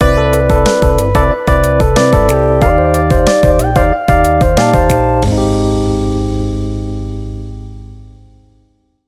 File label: Goal Jingle